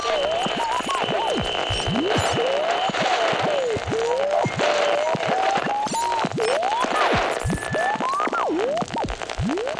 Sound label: ambient; SGI O2 Out of Box Experience 2.2